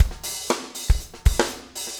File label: 4 on floor 120, loops, 120bpm